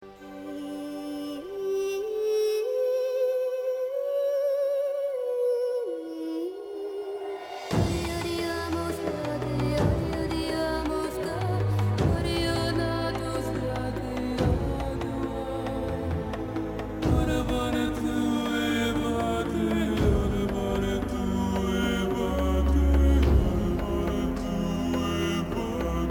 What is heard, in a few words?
rap